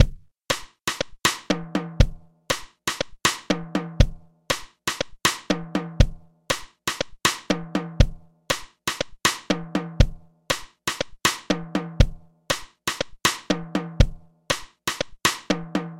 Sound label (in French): songo batterie